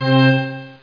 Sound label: ORGAN; SimCity2000 Interactive Demo